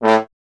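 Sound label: bass trombone A; Miroslav Vitous; Solo Instruments VOL-1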